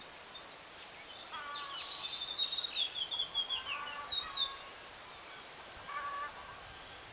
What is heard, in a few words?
Plain gerygone